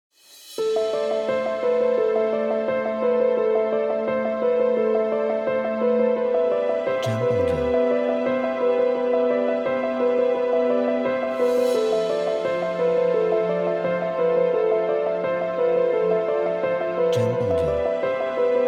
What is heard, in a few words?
Emotional Piano Trailer